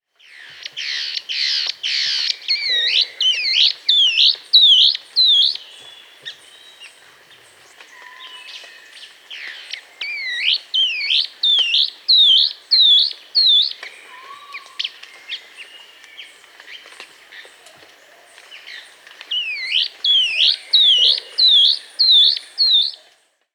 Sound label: Long-winged Antwren (Myrmotherula longipennis), Peru; S. America